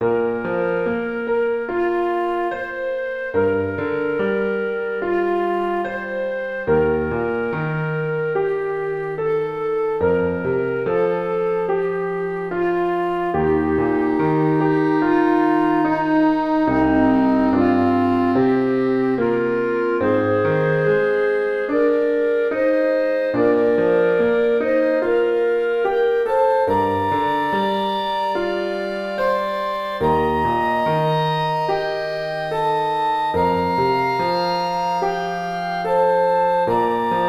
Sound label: Lullaby